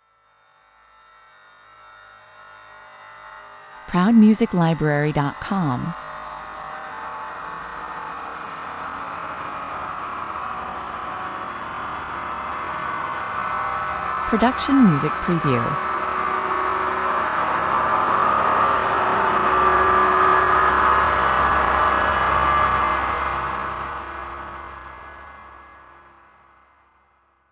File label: emerging gloom 127 bpm